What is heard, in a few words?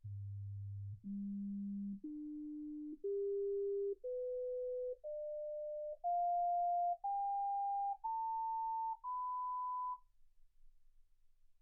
sinus tones 48kHz